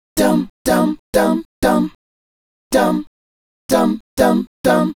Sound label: Male Group, Hip-Hop and RnB Vocals